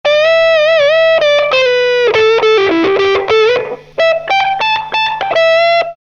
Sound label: Turbo Sustained Solo, Guitar Effects Pedals; Pro Co